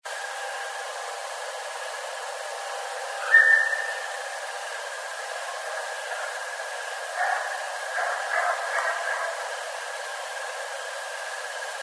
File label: whistles; bigfoot